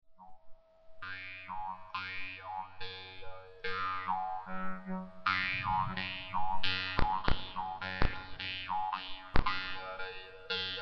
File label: vargan